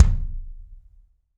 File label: BD AMBIENT, Drums; Real Mega Drums